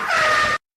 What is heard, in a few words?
girl scream